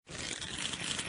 compressing

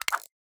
menu open; game; dapopinata

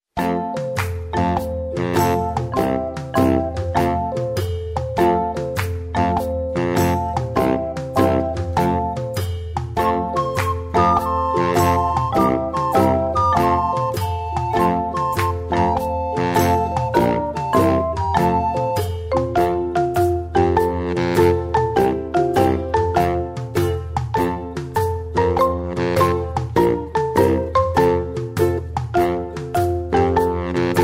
music

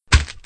landing

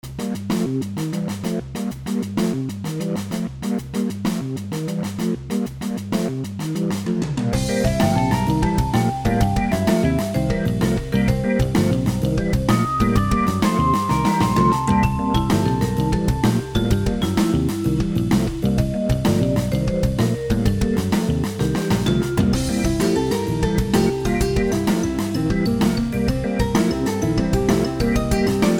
game music

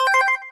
money bonus

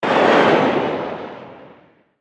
speed air; game

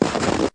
ball; snowman; icecream

